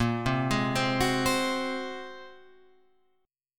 A sharp 7th Suspended 2nd Sharp 5th, Guitar Open F chord; arpeggiated; JGuitar